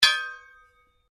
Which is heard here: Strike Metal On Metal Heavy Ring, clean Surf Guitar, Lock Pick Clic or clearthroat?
Strike Metal On Metal Heavy Ring